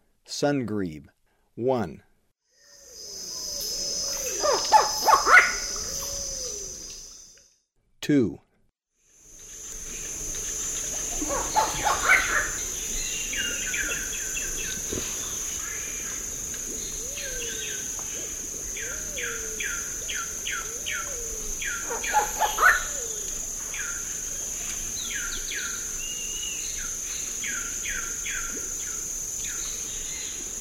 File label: Sungrebe; S. America